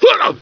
SW YELL

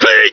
SW YELL

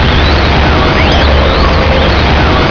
TORNADO

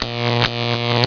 GNT HIT

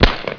UZI FIR

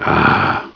AHH

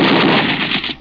UZI FIRE

